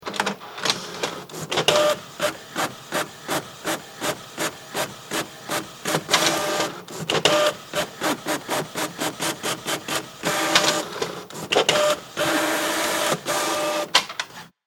Mechanical sound of computer printer, Inkjet Printer; Printers; Electronics